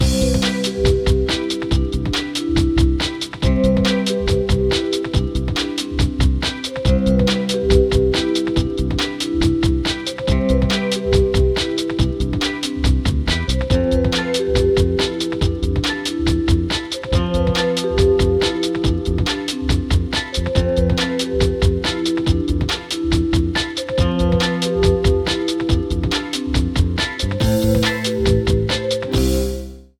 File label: no melody